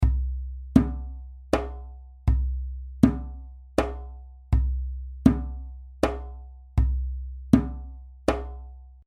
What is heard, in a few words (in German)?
Die 3 Toene Bom Tu Ta, djembe